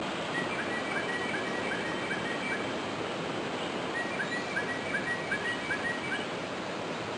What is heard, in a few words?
Greater Racket tailed Drongo; Amar